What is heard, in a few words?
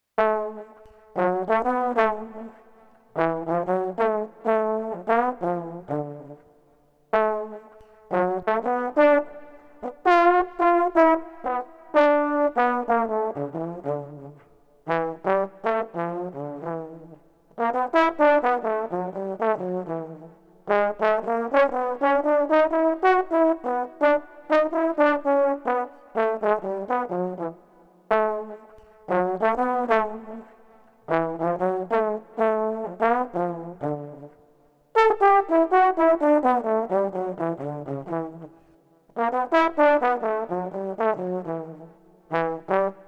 Trombone Cool 123